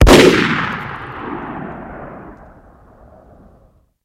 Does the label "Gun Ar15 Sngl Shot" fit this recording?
Yes